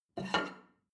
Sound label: plate take table slide hard; berufe; soundpool